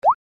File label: item removed from basket; princess